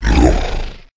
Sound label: wallmonster cry; Crypt of the NecroDancer